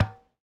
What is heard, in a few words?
Tennis Racket Ball Hit Light